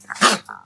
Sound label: kobold snort